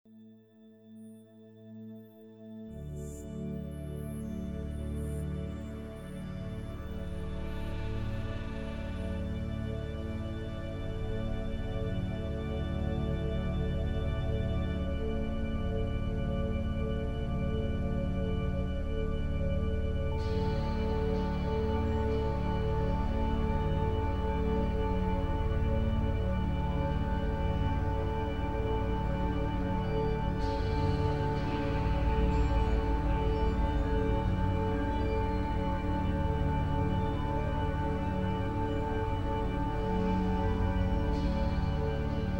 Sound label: Sax Noir Ambiance